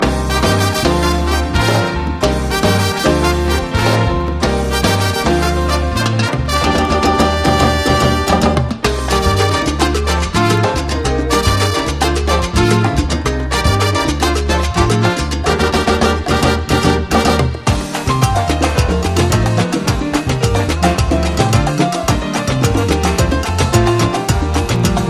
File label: LATIN COMBO INSTRUMENTAL